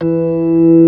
ORG. E3; B3 FULL

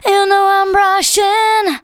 VOCALS SUNG; Techno Trance Essentials

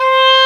OBOE C4, WIND; AKAI S-Series CD-ROM Sound Library VOL-2

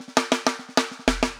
SHRED, Backbeat

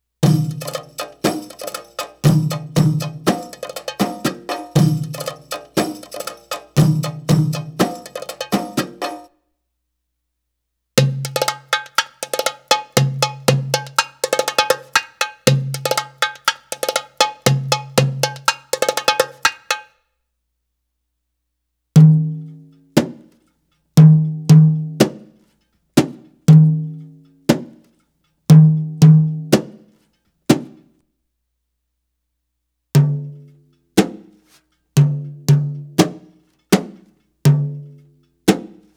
Arabic Rhythms

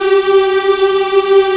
African Mm f; Roland LCDP11 Africa VOL-1

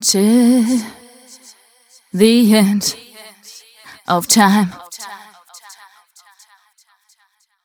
f mel c, fem Vocals; Mutekki Media - Diamond Vocals 2

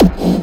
hard bass drumz

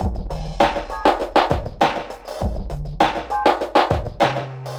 drloop, drumloops, Vinyl Attack; Masterbits

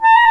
THE CLARINET

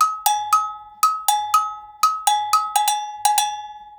120 AGOG, Percussion Loops, AGOGOS

